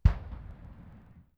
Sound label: distant gun 60mm; WAR